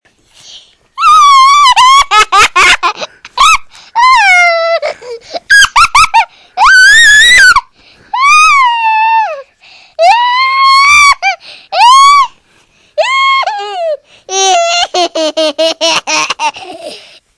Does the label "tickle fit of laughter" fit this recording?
Yes